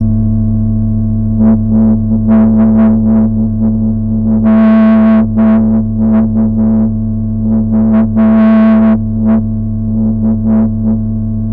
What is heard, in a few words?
SUB WAVE, Special FX Twisted; USB Soundscan; AKAI